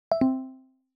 notification 3rd party catchall; BlackBerry OS-10

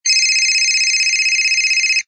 Standard, Ringtone; HTC Freestyle

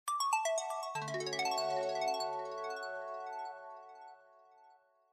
sms; Huawei C5589

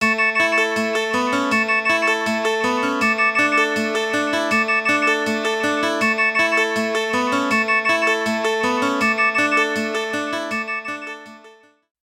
Melody Alarm Clock; LG

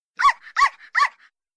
Puppy Beep; LG KE500

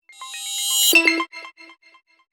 Urget, notifications; Motorola RAZR V XT885

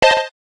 digital, keytone

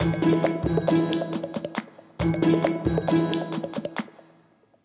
system alarms; Amazon Fire-Phone

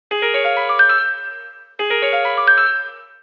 birdsong, Nokia 8800, phonetones

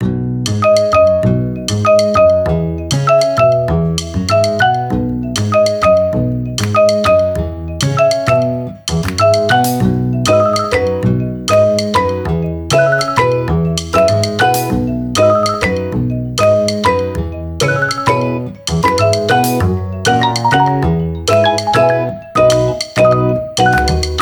Kitten, ringtones; LG X power 3

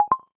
Cable Connect

